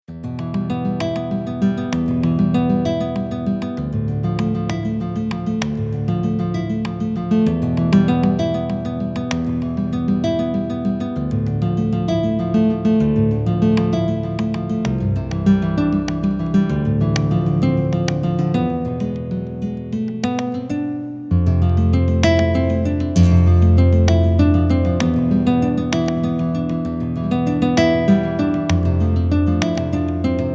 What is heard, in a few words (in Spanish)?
Guitarra